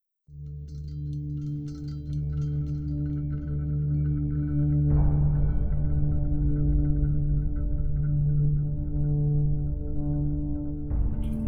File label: strange guitar feelings